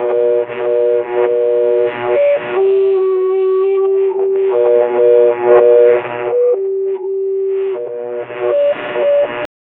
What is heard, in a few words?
radio signals distorted; scary